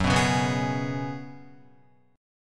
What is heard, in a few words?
F sharp Suspended 2nd Suspended 4th, Guitar; strummed